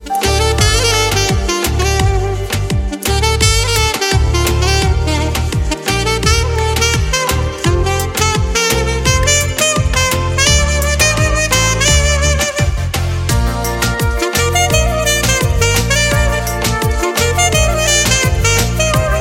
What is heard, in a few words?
kalimba